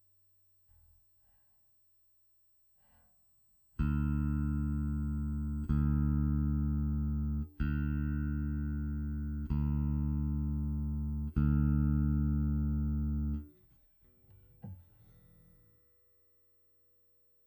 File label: Bass